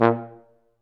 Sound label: F TUBA, Solo Brass, Tuba stacc